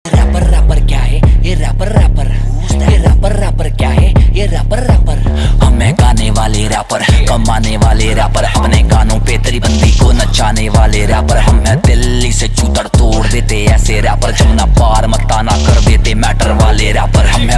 Dance Punjabi Ringtone

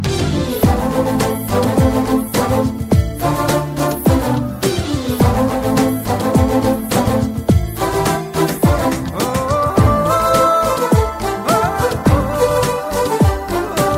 Theme Telugu Ringtone

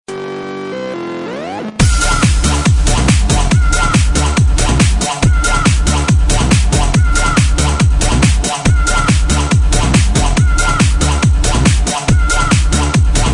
Techno Mix Ringtone

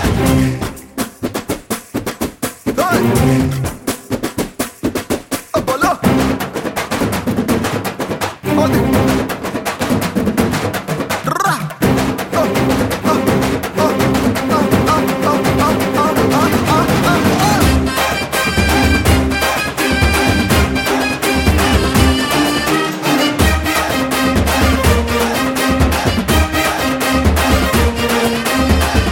Drum Beats Telugu Ringtone

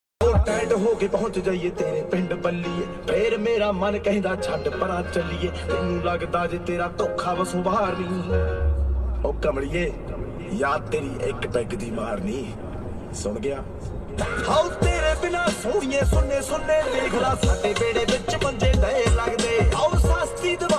Punjabi Ringtone

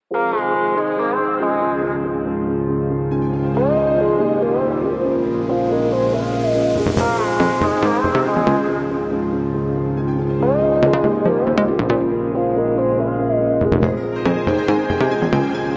Love BGM Telugu Ringtone

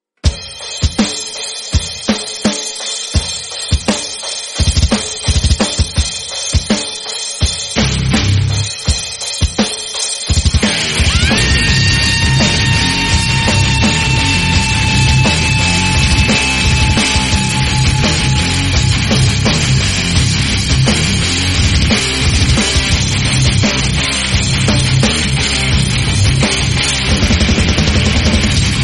Guitar And Drums

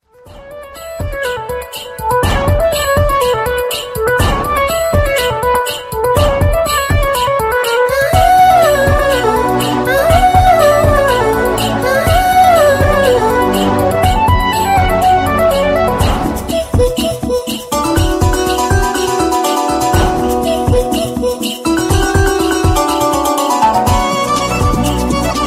Dreamy Ringtone

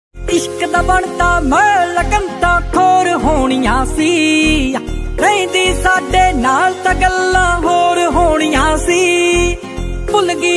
Punjabi Ringtone

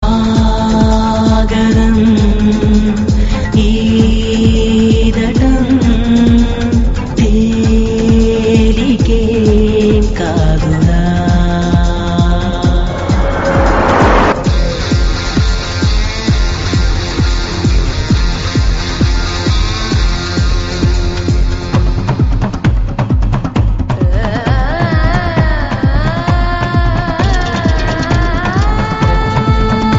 Humming Telugu Ringtone; Okkadu

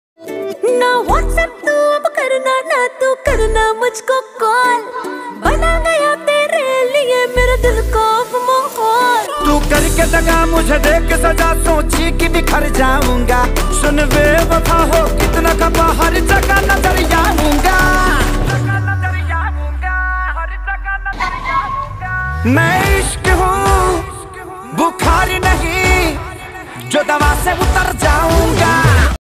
Love Bhojpuri Ringtone

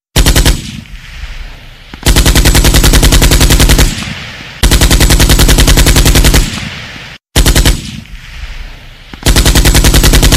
Machine Gun Sound Ringtone

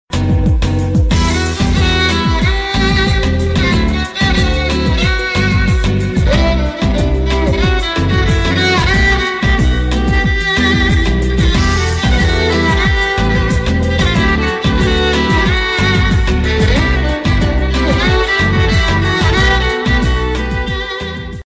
Sad Violin

